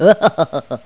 HAHA; Nare Gewoontes

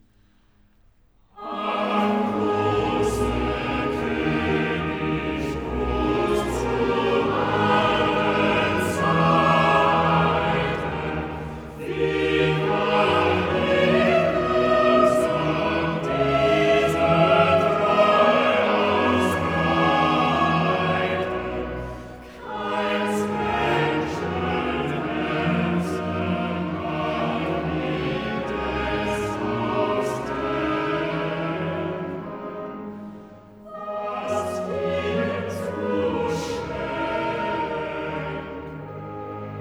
Chorale; Ach grosser Konig, gross zu allen Zeiten

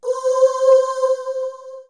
Chorus; Easyworship; Oak River Church

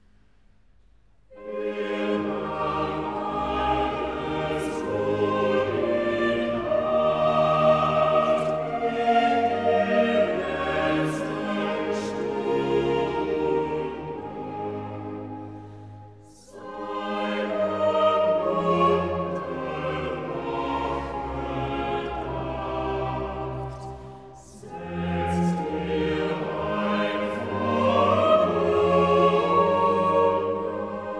Chorale; Er nahm alles wohl in Acht

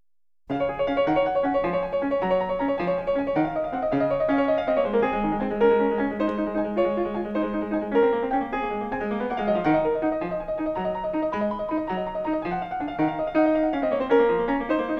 Prelude No. 3, in C-sharp major; BWV848; Glenn Gould; Bach; The Well-Tempered Clavier; WTC Vol I

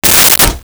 Medicine Cabinet Door Open; 1001 Sound Effects; Doors & Drawers

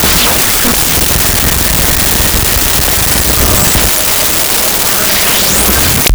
starter; Sound Effects Library (28Cds)

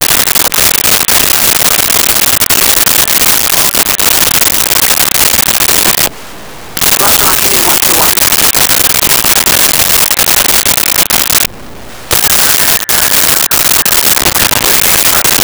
Police Radio; Vocals & Wallas; SONY PICTURES SOUND EFFECTS SERIES

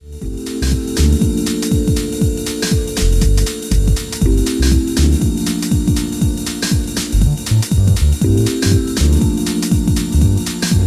Still Flute

